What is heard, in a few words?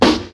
nineties dark sounding hip hop steel snare drum sample d sharp key